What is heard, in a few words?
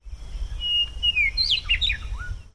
Western Meadowlark, CO